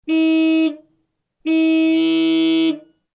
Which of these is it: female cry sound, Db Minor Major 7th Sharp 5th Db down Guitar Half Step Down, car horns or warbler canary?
car horns